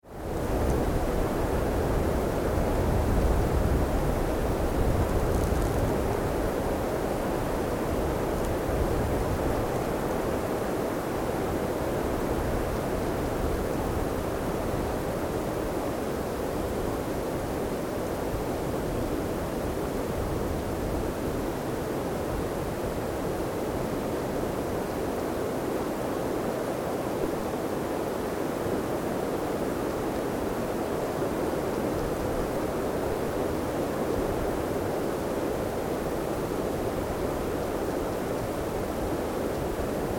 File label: Wind on Frozen Landscape, Open wind with distant frozen sea; Wind Sound Effects